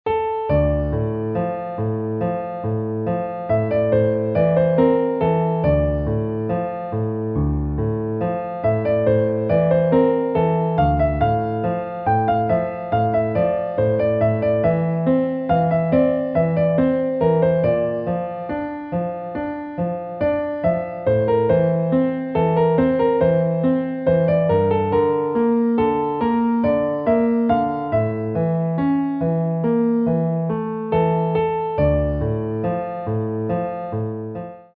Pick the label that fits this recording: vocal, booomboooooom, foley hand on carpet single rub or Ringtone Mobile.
Ringtone Mobile